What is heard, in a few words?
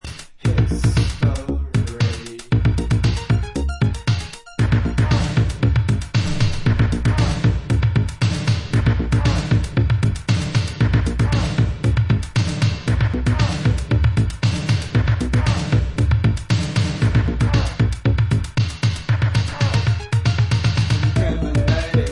pulse beat